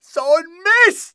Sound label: hit, pain; mohaa